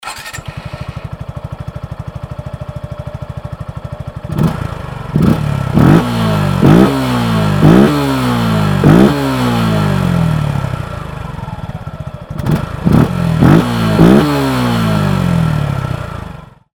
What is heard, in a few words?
gixxer hks; mukidasi